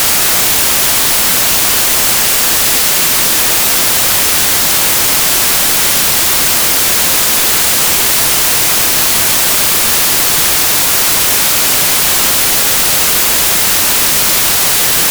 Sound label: white Noise